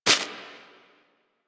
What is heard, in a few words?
Impact Generic; Warframe; Caliber Chicks minigame; weapons; hit effects